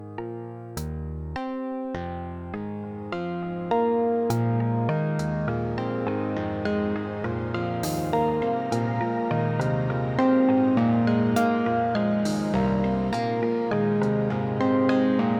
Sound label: Time Synd Four Second Delay; StarLab